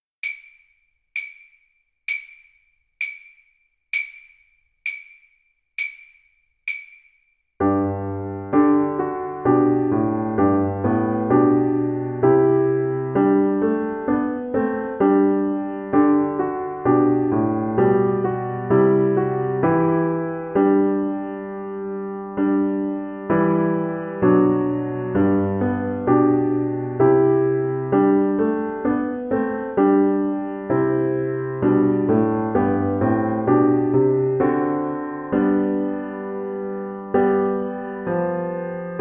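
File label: vc karaoke, Cello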